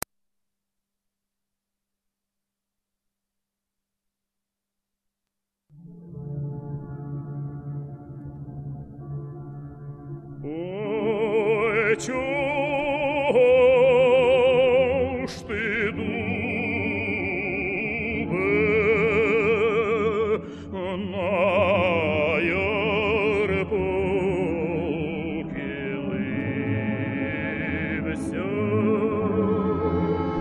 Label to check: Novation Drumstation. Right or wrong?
Wrong